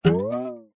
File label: cartoon pluck twank spring